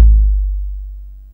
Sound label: 808 BOOM; TR-808; Hollywood Edge; The Big Bad Beatmachine